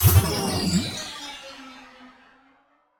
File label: Slow Spell Less Powerful